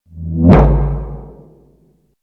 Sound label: rush job target